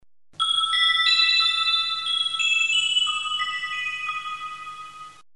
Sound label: message tone